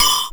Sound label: A#, Synclav FM PERCUSSION; Big Fish Audio